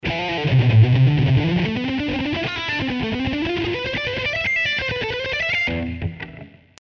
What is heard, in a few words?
scale patterns, guitar